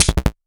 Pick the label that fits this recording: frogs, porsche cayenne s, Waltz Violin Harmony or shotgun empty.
shotgun empty